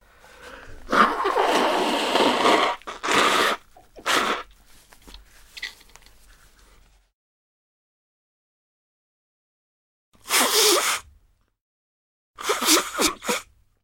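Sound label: blowing noses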